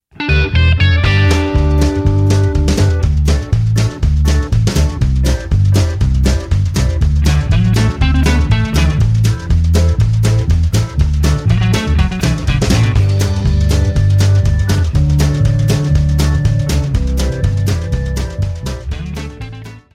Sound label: Oranges Instrumental